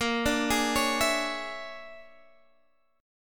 Bb 7th Sharp 9th Flat 5th Bb, Guitar Half Step Down; Bb7#9b5